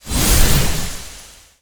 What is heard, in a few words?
atk wind charge